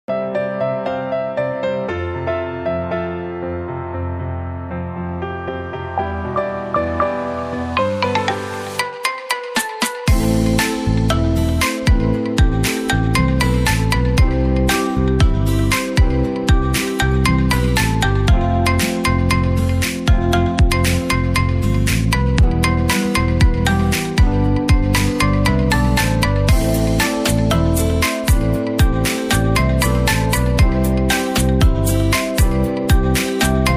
iphone ringtone bgm ringtone; new english ringtone

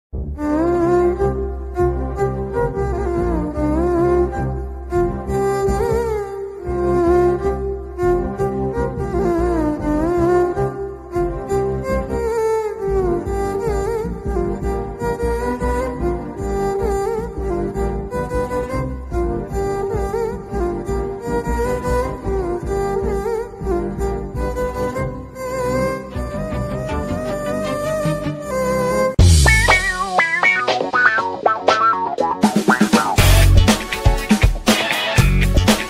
violin; violin version

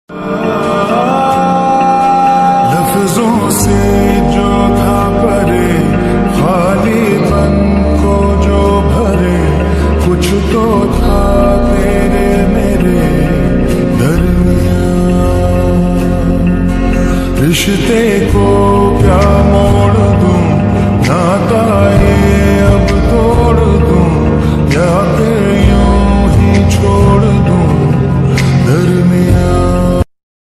slowed reverb ringtone lofi ringtone

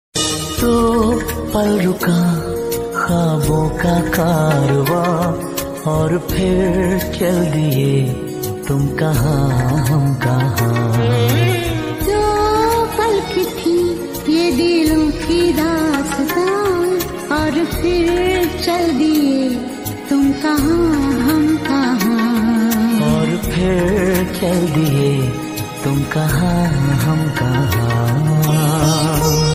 love romantic ringtone

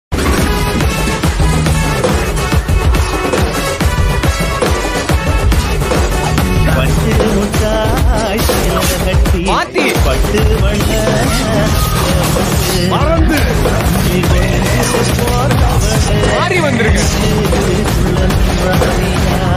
bgm ringtone tamil bgm; mark antony; new trending bgm

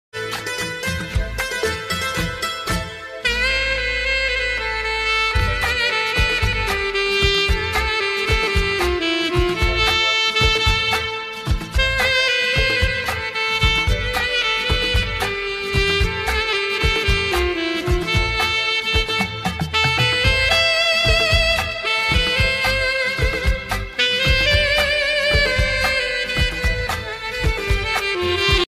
ringtone alto sax

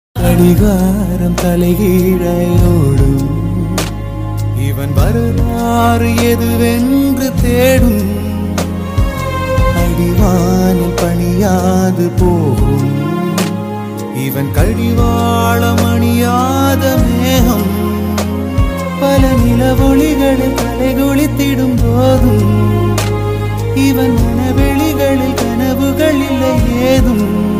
tamil love ringtone

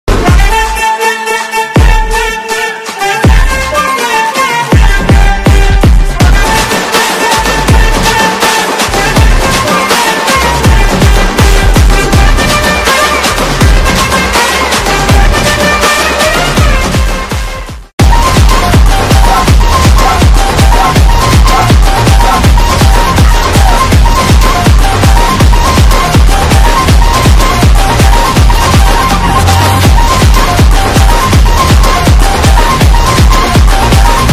flute bgm bass boosted

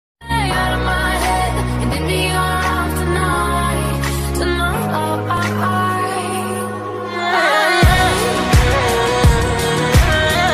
song ringtone bgm 8d audio; master ringtone

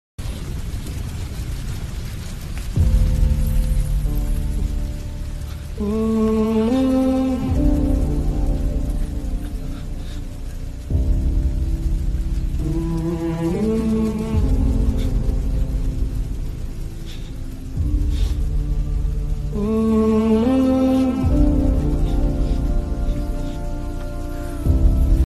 emotional scene background bgm; dasara; keerthi suresh

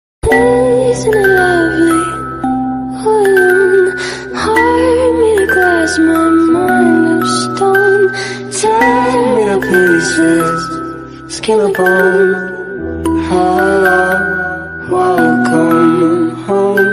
remix ringtone

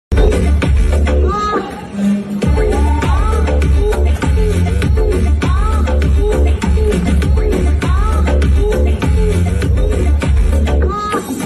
moaning bgm; vikram; tamil moaning

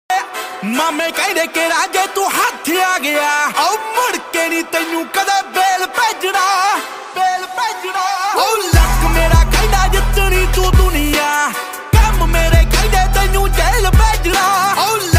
Punjabi songs ringtone

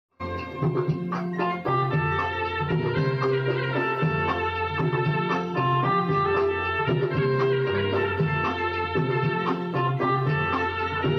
piano ringtone

